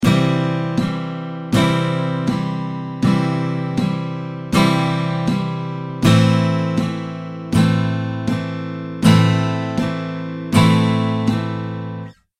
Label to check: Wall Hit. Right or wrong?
Wrong